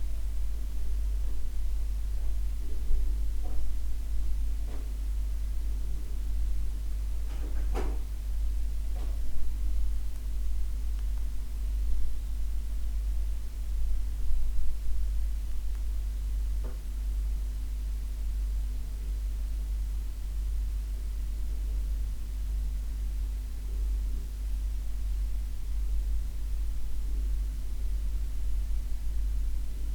kuehlgetraet zu hause; kuehlgeraet zu hause

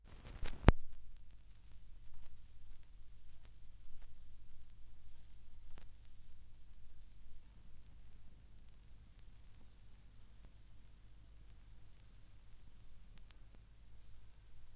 schallplattengeraeusche

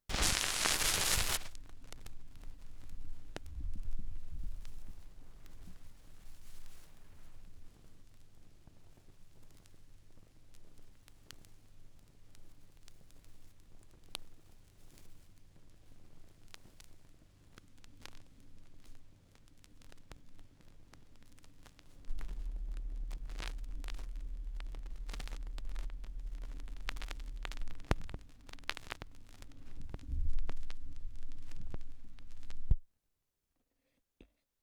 schallplattengeraeusche

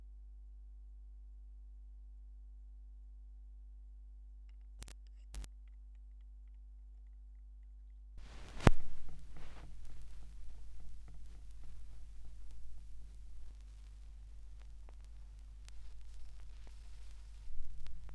Schallplattengeraeusche

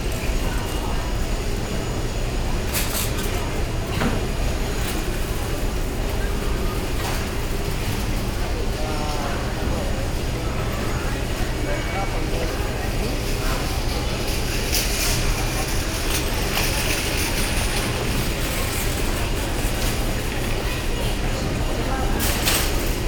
supermarkt, Brasilien

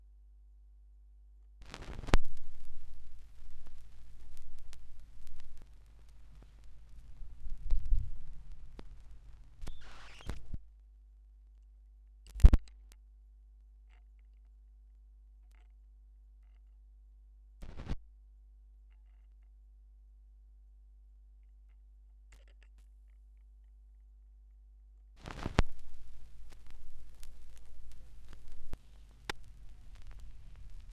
schallplattengeraeusche

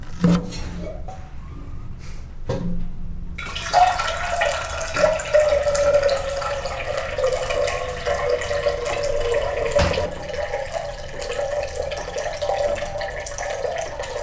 Wasser, Fieldrecordings Brasilien

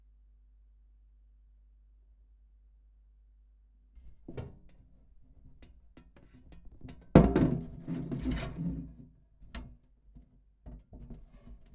Bohrmaschine mit Blechdose

